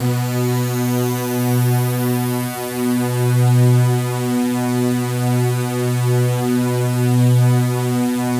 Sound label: SIZZLE B3, SIZZLEPAD; Drums In Your FACE!